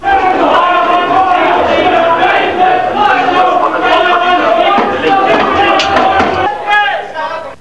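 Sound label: stock exchange; freeciv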